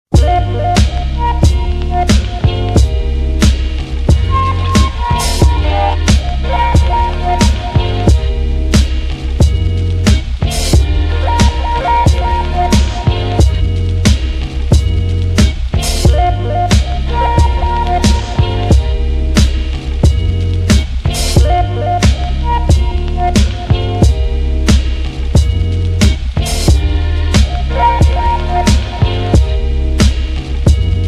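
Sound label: instrumental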